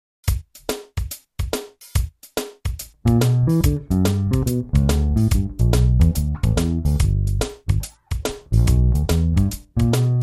Jazzy G lick

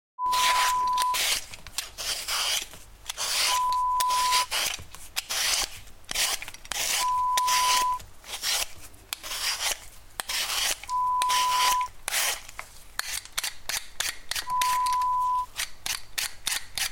Peeling Vegetables sound

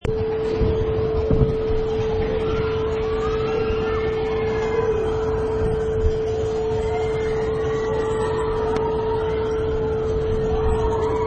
Roller Coaster Ends

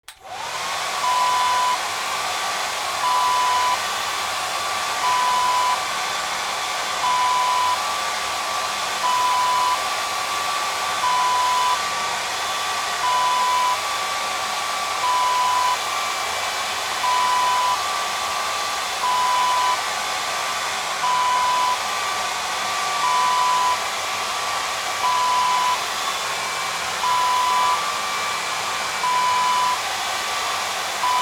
hair dryer

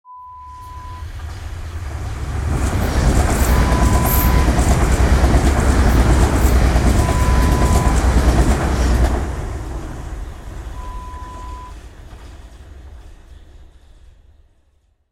train passing sound

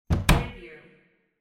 closet door close

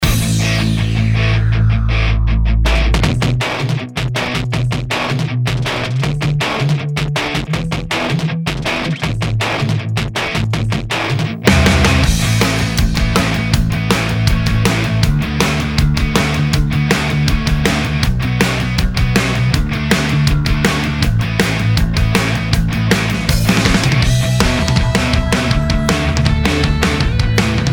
rock kit pro

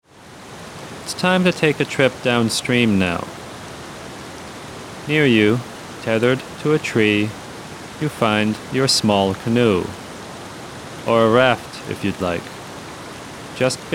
Down River

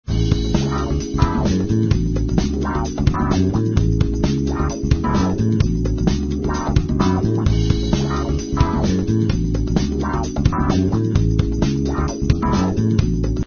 Dinner Jam

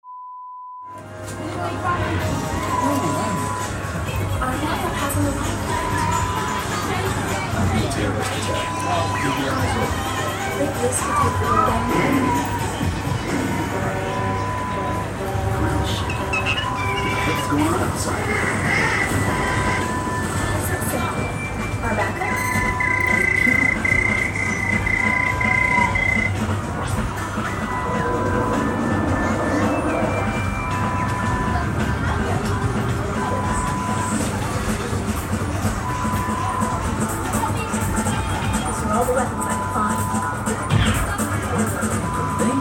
arcade sound

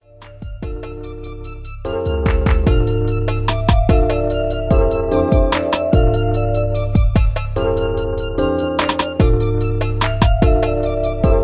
Instrumentals 147bpm

